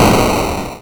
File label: death